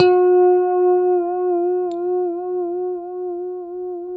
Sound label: G4 pick harmonic, Guitars; Spectrasonics; Hans Zimmer Guitars VOL-1; JC-HARMONICS